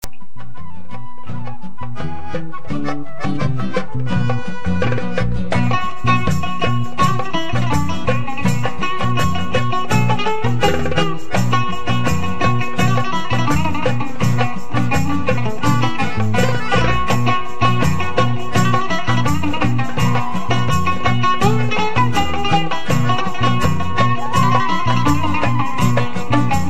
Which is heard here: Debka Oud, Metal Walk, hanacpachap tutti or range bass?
Debka Oud